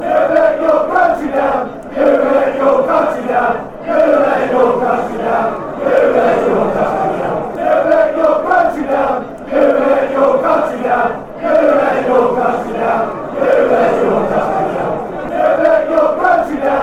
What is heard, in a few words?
you let your country down, fanchants